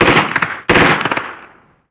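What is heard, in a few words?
gun mp5 medium; ModernWarfare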